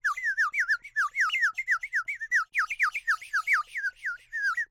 Bird whistle, clay, painted, water, airy, wispy, choppy, stiffled chirps, slap fight; Eiravaein Works; Vaeyan IV